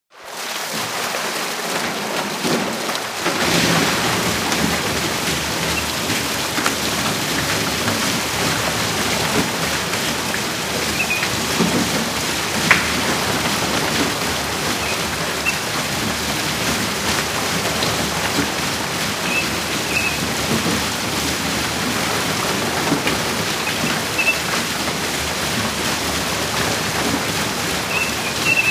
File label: Water turning the wheel of a hydro turbine; river